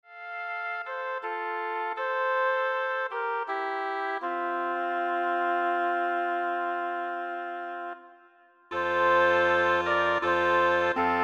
Woodwind Ensemble

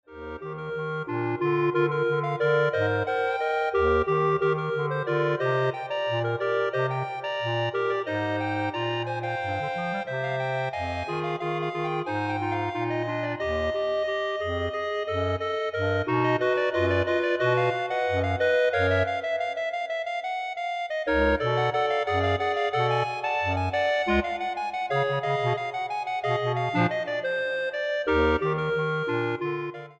Clarinet Ensemble

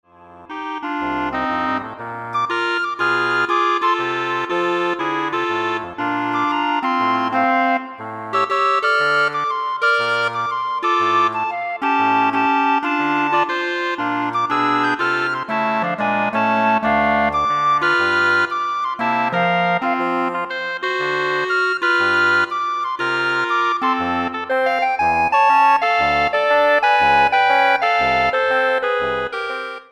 Woodwind Ensemble

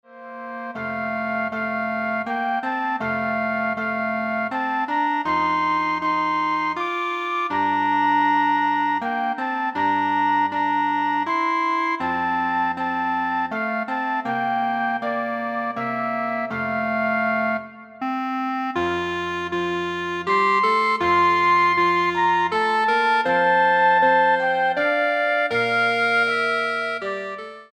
Flute Clarinet Away in a Manger

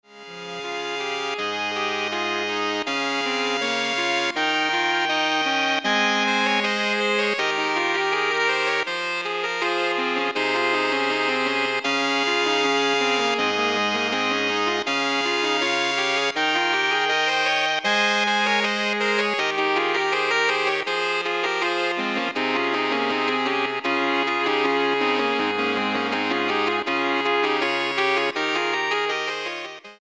Saxophone Ensemble

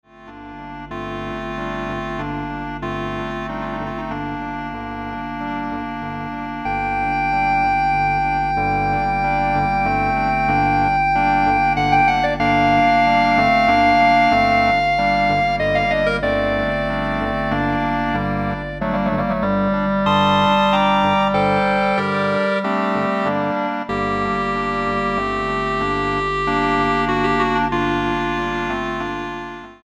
Clarinet Ensemble

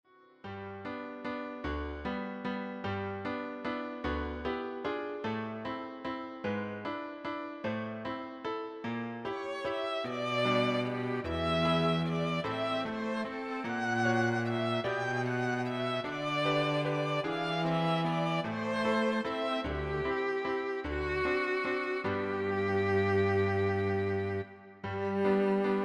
Piano Trio

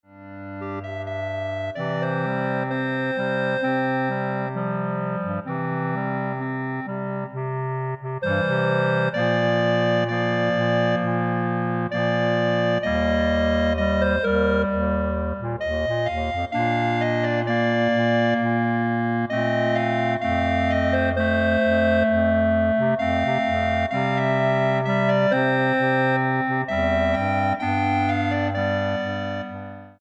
Clarinet Ensemble

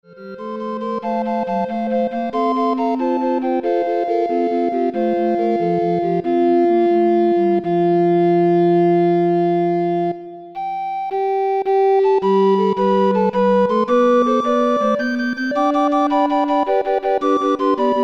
Recorder Ensemble

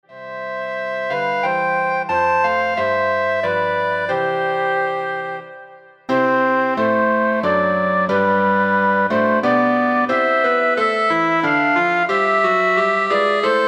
Flute Clarinet

